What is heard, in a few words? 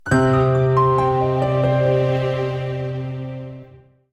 Defeat Stinger; The Wild Age